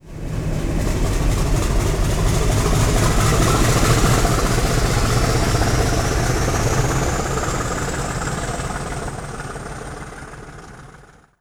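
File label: class 40, train; lwalker; simutrans; Pak128.Britain-Ex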